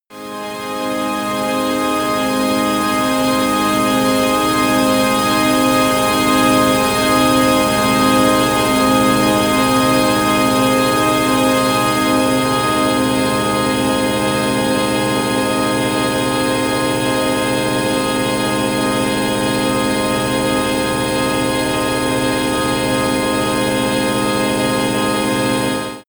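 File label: cathedral air, pads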